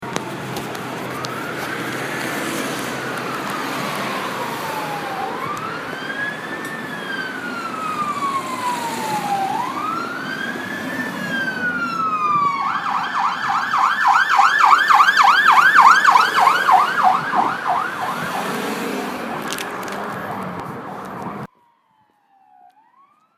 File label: ambulance sound